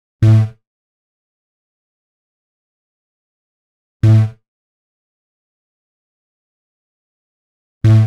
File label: 126 B#m Bass, Bpm126, Complex Electro Synths; Prime Loops; Biomass